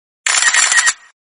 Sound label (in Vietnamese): tieng chup anh lien tuc